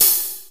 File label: open hat sound a key; open hi hat